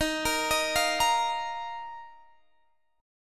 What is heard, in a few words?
Dsharp Suspended 2nd Guitar Standard; arpeggiated; x,18,20,20,18,18; capo=2